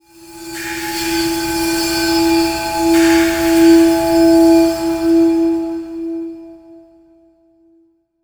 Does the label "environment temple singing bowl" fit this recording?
Yes